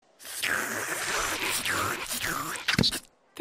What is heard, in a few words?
slurp sounds